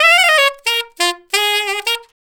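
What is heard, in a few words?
089 West Coast HipHop Sax Bb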